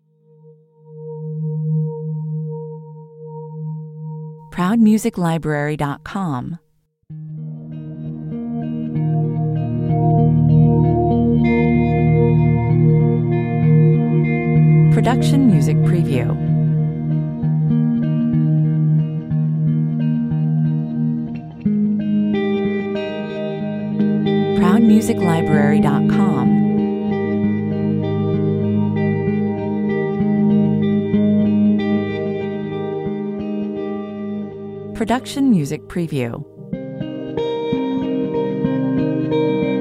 Ambient piece with live electric guitar, synth pads; 65 bpm; Its very warm, welcoming, positive, dreamy... heaven; Ambient piece with live electric guitar, synth pads and me singing